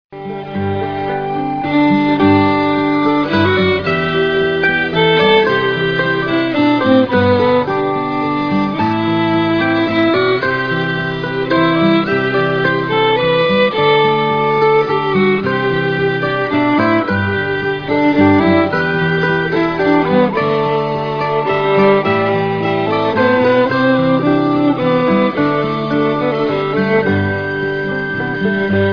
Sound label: waltz